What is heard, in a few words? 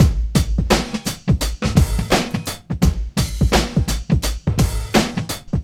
acidized, Drum loops, Funky